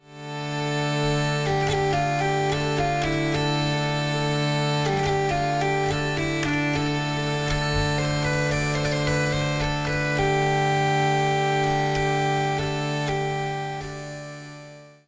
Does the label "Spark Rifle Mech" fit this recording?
No